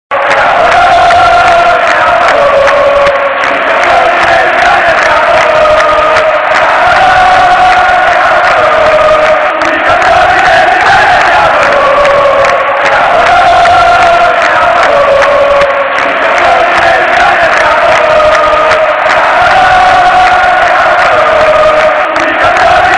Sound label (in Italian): i campioni dell'italia siamo noi; Cori sud